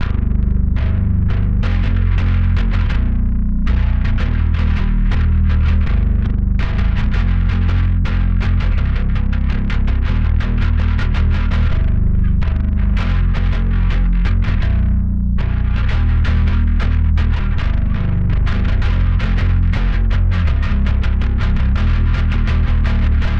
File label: Chorus Bass, modern metalcore